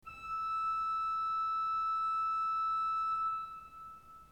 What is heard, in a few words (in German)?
ton, orgel